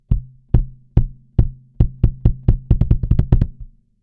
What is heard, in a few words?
kick Pop Kick; Joe Chiccarelli